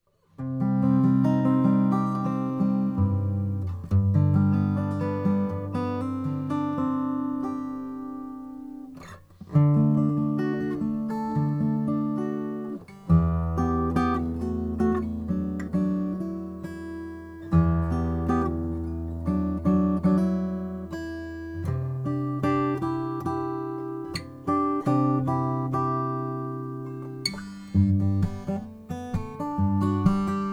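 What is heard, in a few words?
greenfield g2 cocobolo; reserve grade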